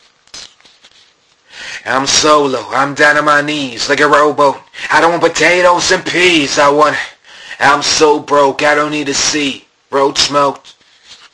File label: Sunday Chimes